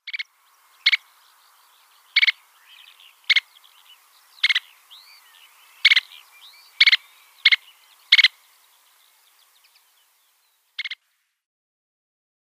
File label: Kentish Plover calls